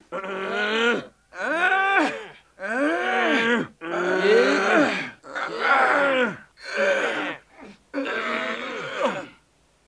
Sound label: Grunting Men Joint Ef